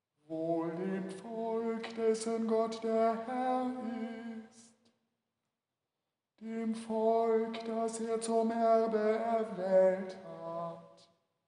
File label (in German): psalmton 8